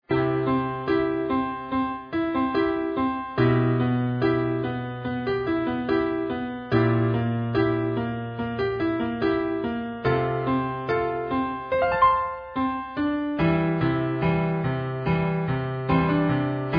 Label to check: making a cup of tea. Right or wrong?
Wrong